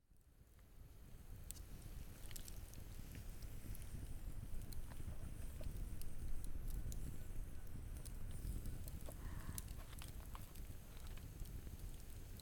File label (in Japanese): 本を読む＆焚き火の音